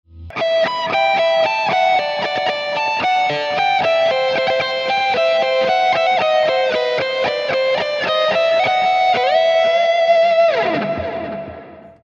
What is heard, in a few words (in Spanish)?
Mesa Dual Rectifier, NUX MG-300; Emulacion de Mesa Dual Rectifier